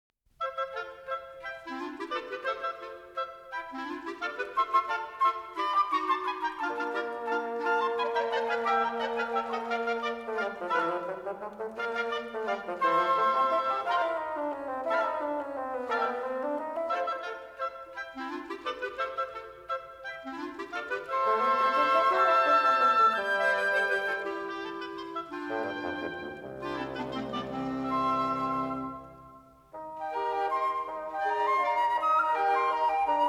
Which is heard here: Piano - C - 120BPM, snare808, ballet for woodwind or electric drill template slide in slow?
ballet for woodwind